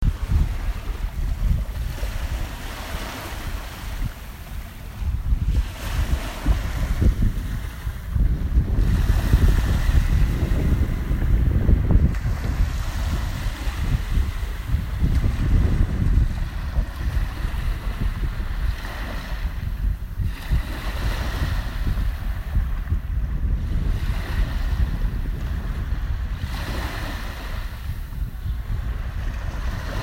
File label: Beach Breeze And Waves